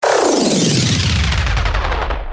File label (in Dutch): Hoefnagels Jingle, Kermis Jingles; Kermis Jingles Diversen